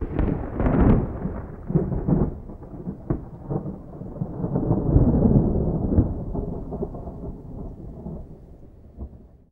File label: thunder, weather; Minecraft; Conquest Default